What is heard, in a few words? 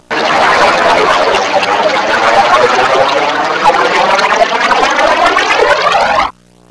RIVER